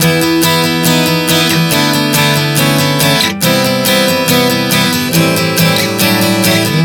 E-D-C-Am-Bm 140 bpm, acoustic guitar chord progressions